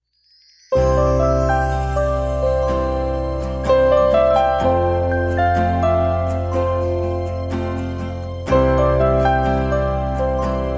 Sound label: Tranquil Breeze Piano Lead